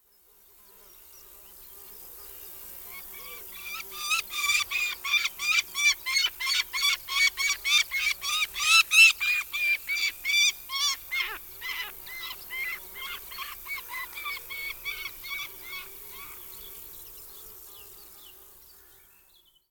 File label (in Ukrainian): Сапсан (Falco peregrinus)